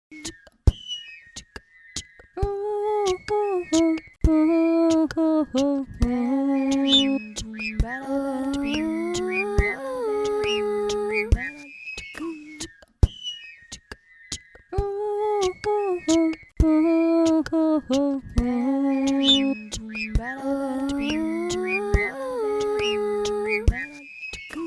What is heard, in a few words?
Loop